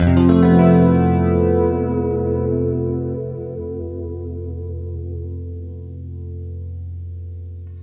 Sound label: FLESH CHORDS, Guitar